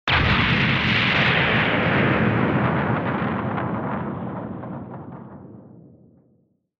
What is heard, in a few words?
Retro Anime Explosion High Long